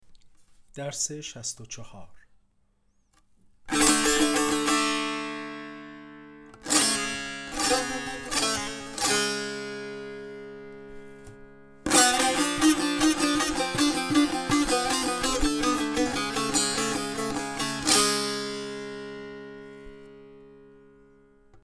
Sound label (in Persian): مشق تنبور